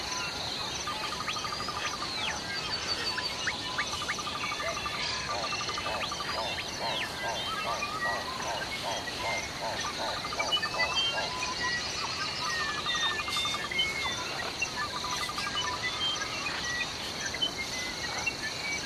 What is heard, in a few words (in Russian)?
Priroda